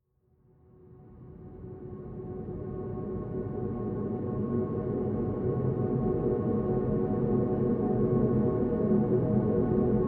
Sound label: dark horror soundscape